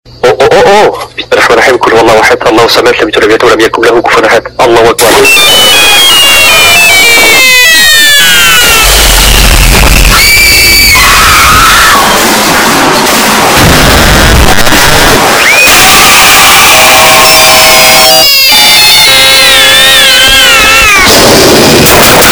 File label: superwarning